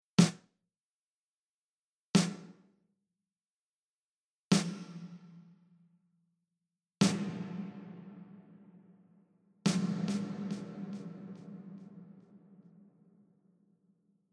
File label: Drum – dry to reverberant; Sound-makers